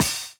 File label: Crash; Equipped Music; Loungin House